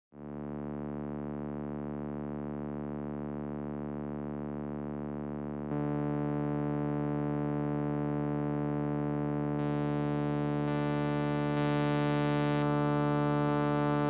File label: Solina V Instrumenten Mix; Arturia